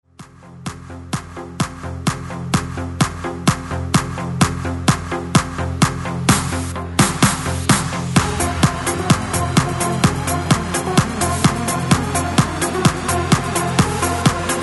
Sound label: BREAKMIX